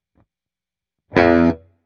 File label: Guitar